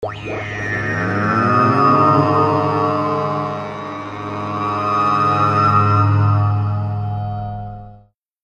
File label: Saucer Fly By